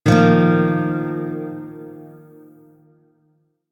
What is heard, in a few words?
Shawzin Pent Min Chord E; Music; Warframe